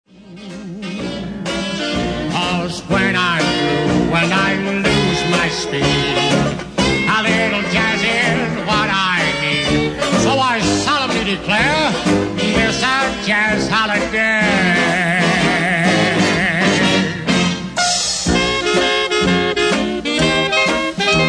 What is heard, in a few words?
jazz holiday